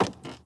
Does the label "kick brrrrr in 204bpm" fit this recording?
No